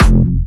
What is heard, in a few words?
Jump Kicks, Bassdrums